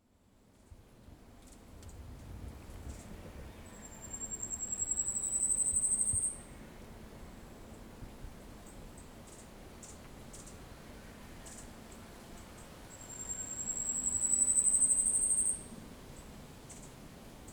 yabusame, bird song

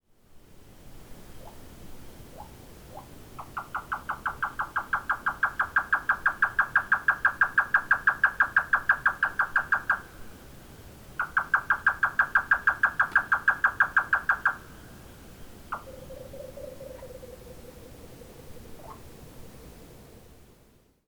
yotaka, bird song; ヨタカ; 特殊声; 新潟県東蒲原郡阿賀町川口 森林; J-eco 鳥のさえずり図鑑

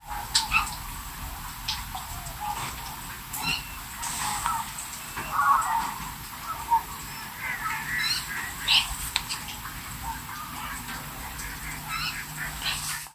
クイナ 鳴き声; クイナ科; 新潟県新潟市北区 福島潟; bird song

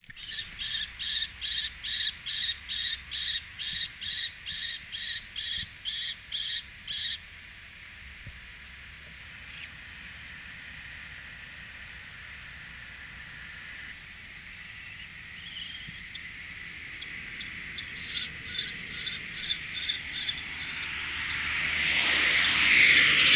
チョウゲンボウ オスを呼ぶメス; ハヤブサ目; ハヤブサ科; 新潟県新潟市秋葉区; bird song

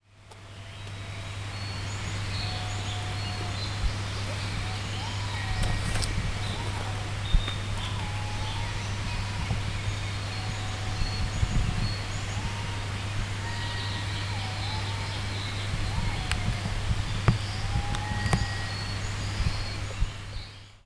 aobato, bird song; アオバト; 鳴き声; 新潟県新潟市秋葉区 菩提寺山

